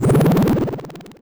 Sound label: Tidal Spawn; Gloom